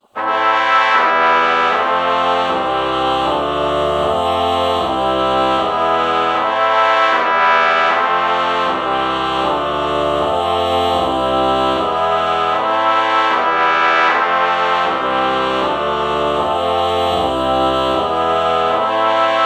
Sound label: ah trumpet chord morph